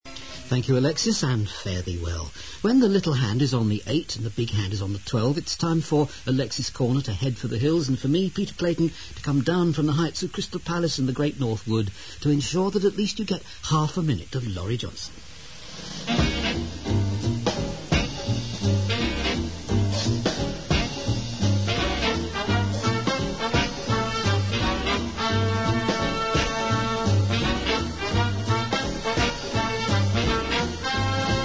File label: sounds jazz; Clayton